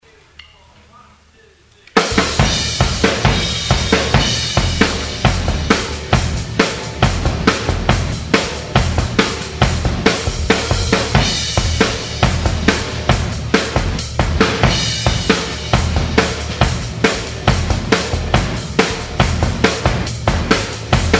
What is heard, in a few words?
Drum outtakes